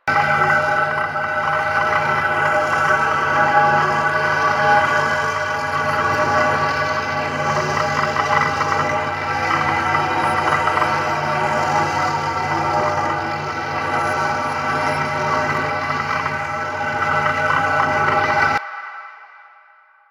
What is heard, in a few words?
Research Pod Idle Loop; JadeShadows; Ascension